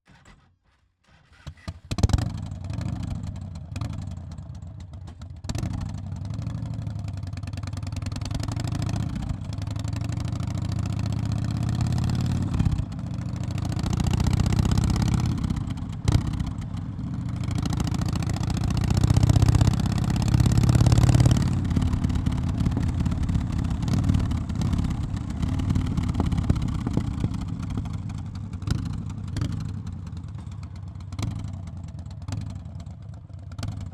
HARLEY Start, Accelerate, Slow Speed pull away, Pass By Medium Speed, Arrive, Harley Davidson; Idle, Stop; Game Audio Factory